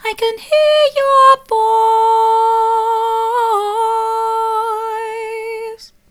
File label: i can hear your voice soft, vocal